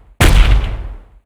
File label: quake; Effect